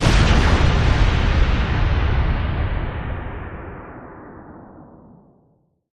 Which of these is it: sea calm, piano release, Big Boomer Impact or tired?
Big Boomer Impact